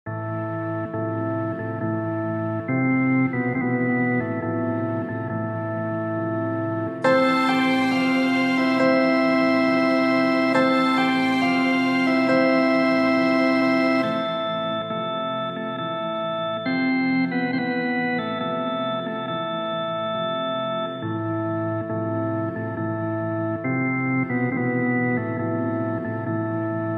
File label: sad music ringtone